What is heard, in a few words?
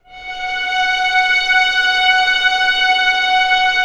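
strings F#4 PP R; Sound On Sound - Peter Siedleczek's Orchestra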